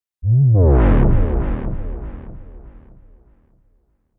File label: zoom down